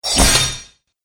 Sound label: Morning Star Hits Armor; Staff And Rod